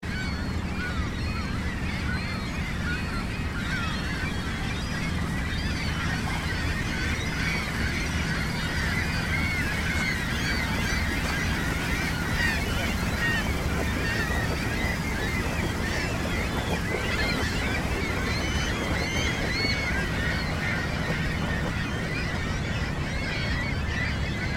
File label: gulls at Chicago's DuSable harbor